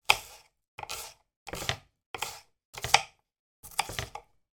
food chopping onion; sound effects one

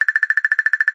cartoon wood taps knocks woodpecker; zapsplat

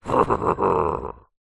fantasy giant laugh

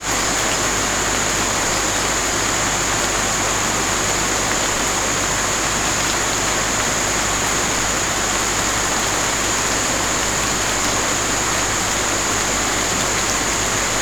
nature rain med ranch roof and leaves trees

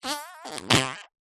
cartoon fart

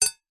impacts metal hit thin ping

